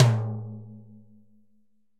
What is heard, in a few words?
Timbales B L Open; Latin Percussion; Drum Hits; Ableton; Factory Packs